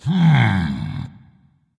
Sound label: thinking dragon; fafnir